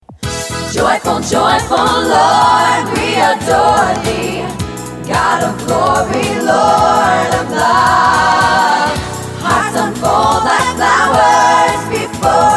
Joyful Choir

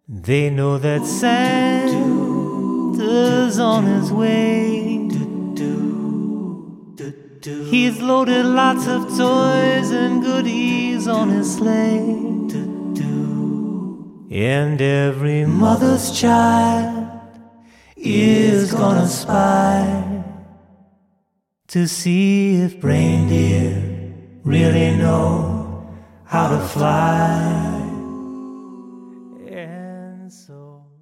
Acapella Xmas; A cappella

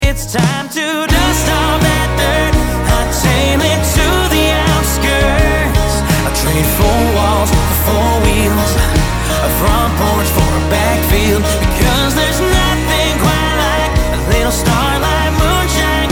Country; Internet Session, Nashville

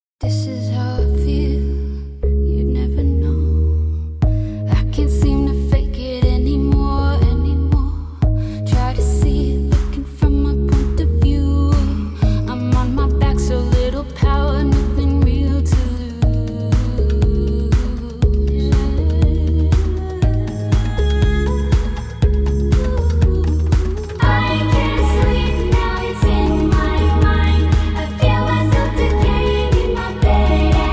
Indie Pop; Internet Session, New York

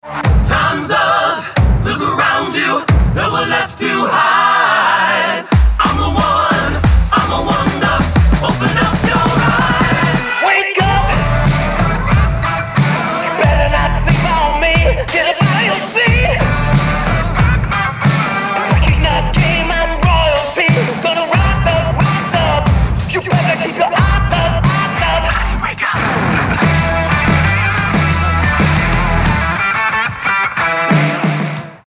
Modern Alt Rock; Blues, Modern Rock

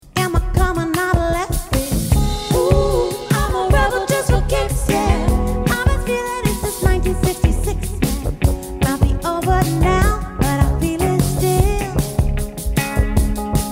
INDIE POP

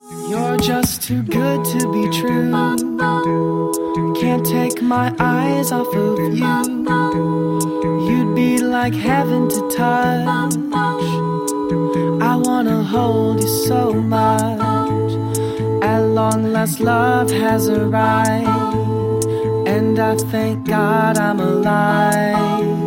Pop pretty voice; Pop demo

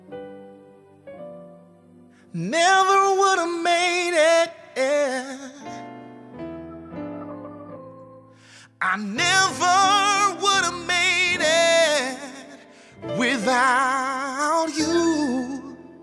Gospel Solo; Internet Session, Los Angeles; Soul Church Music, Soulful

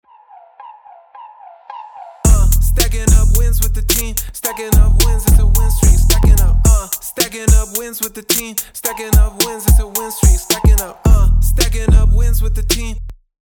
vocalist

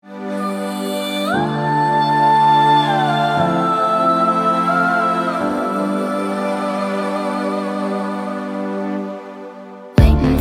Ethereal Pop; Internet Session, Los Angeles

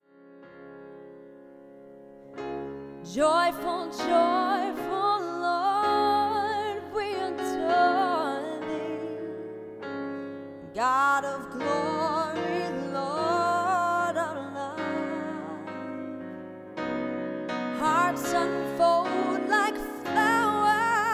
Joyful Lead; Internet Session, Los Angeles

